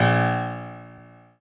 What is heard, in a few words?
Piano Ff